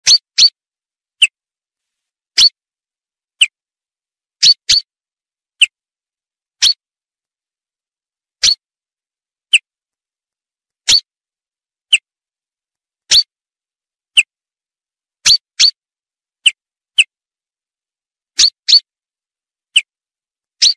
Fringilla coelebs female; سهره خمسه ماده برای جلب جفت

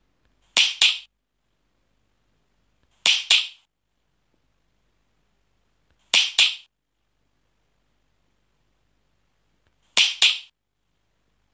dog clicker; کلیکر سگ

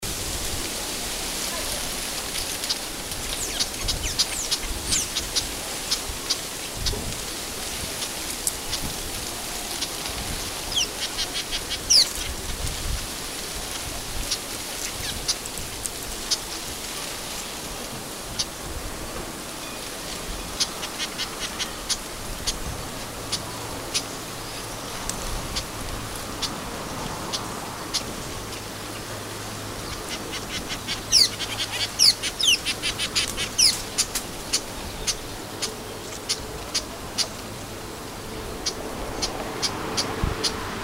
Orange-weaver, پرنده جولای نارنجی; صدای پرنده جولای نارنجی

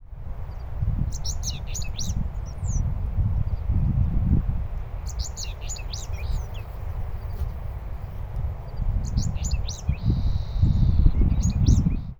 correndera pipit

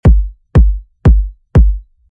Kick Drums; کیک درام

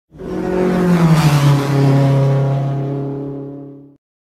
Car racing

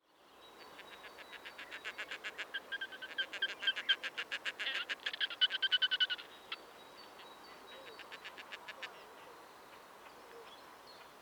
Red necked grebe; كشیم گردن سرخ

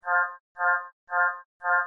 Ringtone

